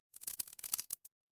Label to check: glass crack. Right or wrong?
Right